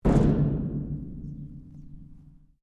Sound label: Strike Metal Hollow Tank Soft; Materials